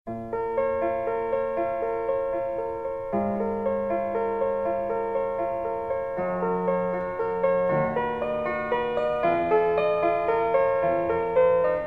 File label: Piano Sonata